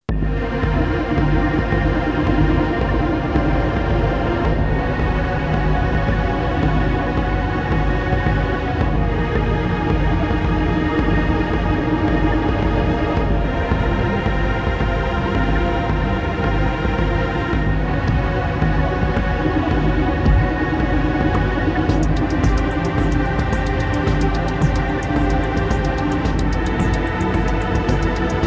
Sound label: Warning Siren; SSBM